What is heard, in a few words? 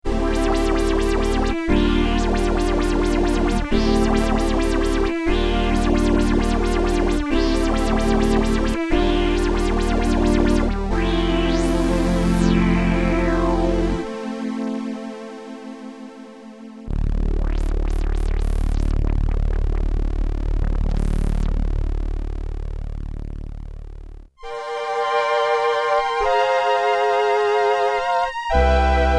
korg delta mix filtermod